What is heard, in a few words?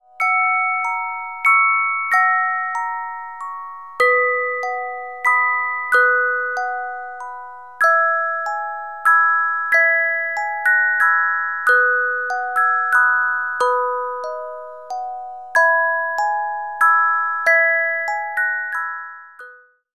Carillon Music Box Melodies